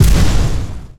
weapons explosion small

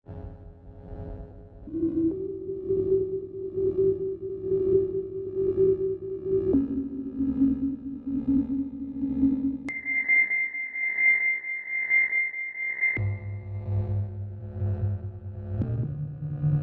Drone loop; FMOD; Ambience; Enviroment

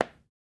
footsteps player wood

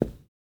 footsteps player carpet

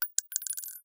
weapons bullet casing; FMOD; Bullet Casings

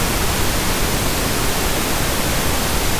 noise short; FMOD

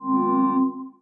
ui cancel

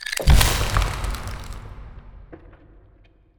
timefreeze; FMOD; Player

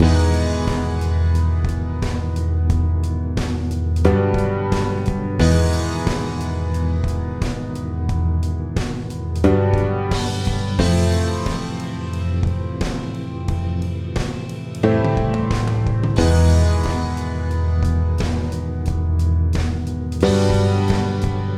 music radio station lofi loop

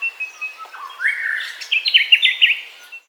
amb bird call; Ambience; FMOD; birds

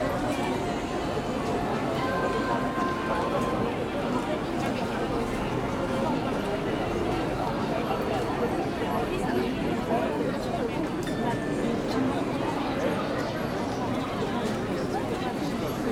ambience city walla pad

